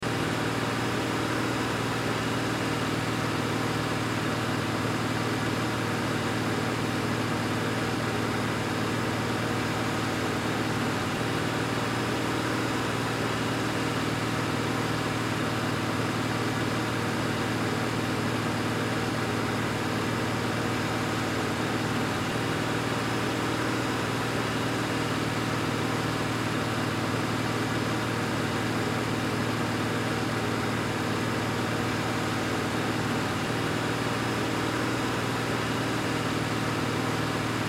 Sports Car Ford Shelby Mustang Gt500 Kr Low Rpm Loop; Transportation Automobile